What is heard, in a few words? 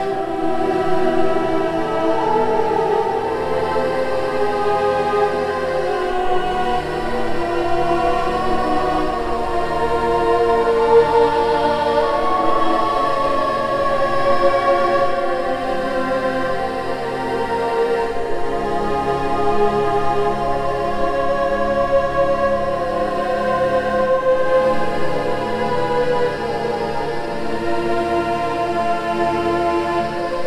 cinematic choirs of passion Em 80bpm